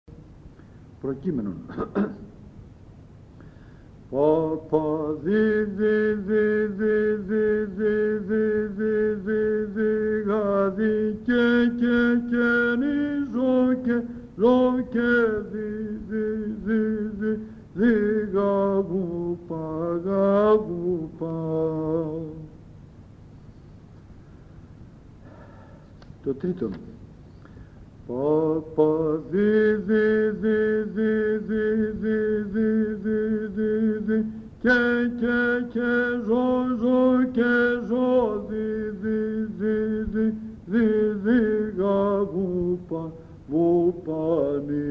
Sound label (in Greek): ΠΡΟΚΕΙΜΕΝΟΝ, Psaltichie; ΑΝΑΣΤΑΣΙΜΑΤΑΡΙΟ; ΗΧΟΙ